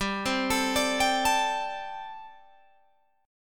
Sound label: G Suspended 2nd Suspended 4th G, Guitar, Open G; chordshape; down